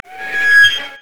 brazier chains groan

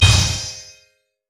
valor basic attack; LoL; sona

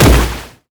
satchel charge; LoL; ziggs poolparty

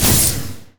dragon strike; LoL; jarvaniv lubu

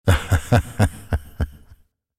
laugh; GemKnight; Character Voices - English

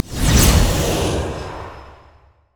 detonate; LoL; varus

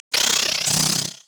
young lizard angry; LoL; monsters

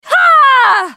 spell cast effort, en US; Riven

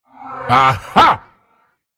spell cast effort, en US

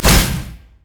deadly bloom; LoL; zyra

